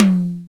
drum, Korg DDD-1, b1; Samples vol.55 - Retro Sampler; DRUMCOMPUTER

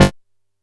SHORT G2; Boom da Bass 4; E-MU Producer Series Vol. 6 – Analogia Project

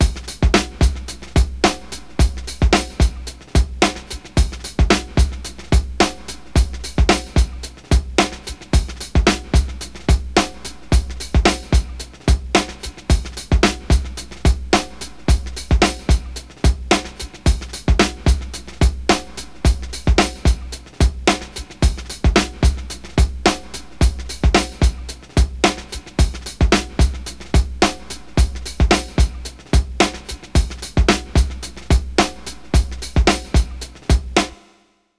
110 Jazzhard Tightness, Breaks; Lockdown Breaks

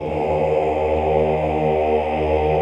CHO, Mix-Combi; Sound & Vision - Gigapack I CD 2 (Roland); Combinations

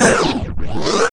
backscratch, scratches; Zero-G; Freak Beats

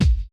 kick, Roland MC-909

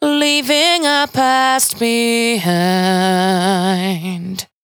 92bpm Bb, Velvet Vocal; Zero-G

